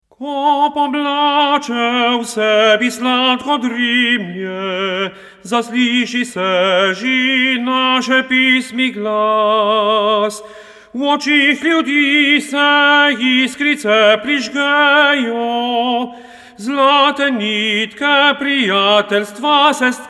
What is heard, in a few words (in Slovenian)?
TENOR; primorska poje; skupna pesem